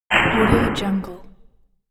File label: machine movement